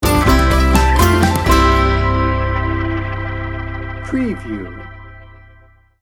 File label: sting bumper